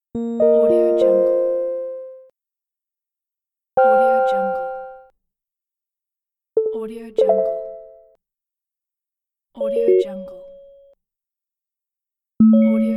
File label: pleasant mobile device sfx